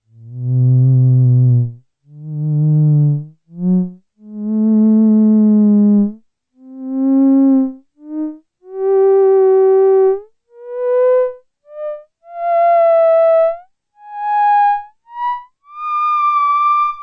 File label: theremin